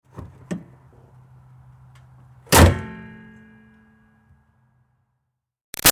Car Highlander Hood Close; 2005 Toyota Highlander V6; Cars; Vehicles; Big Room Sound

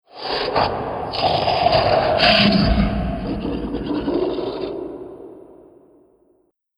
Hungry Beast Horde, monster; Monster Sound Effect

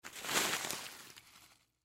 Rock Salt Bag Pick Up; Materials; Foley; Blastwave

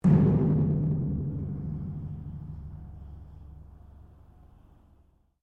METAL IMPACT HOLLOW; Materials; Foundation

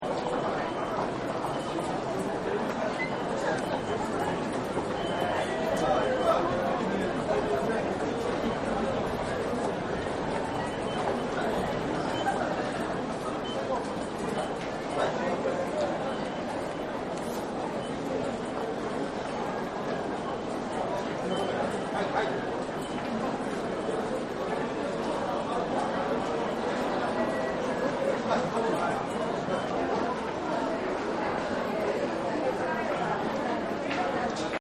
Tokyo Station Turnstiles; Train Stations; Backgrounds

